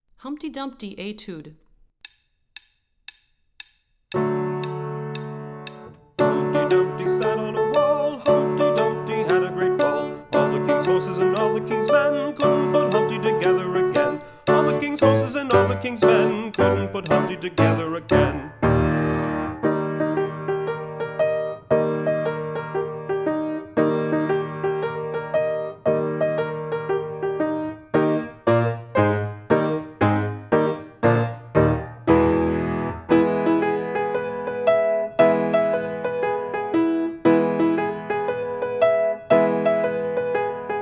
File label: Humpty Dumpty Etude High, warmups